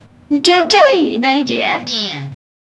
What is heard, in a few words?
chicken scream, poultry